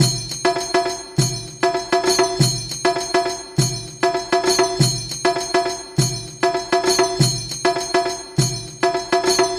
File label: Belly Dance Tabla, Acoustic Beats; Sonic Foundry (Sony Creative Software); Myths of Technology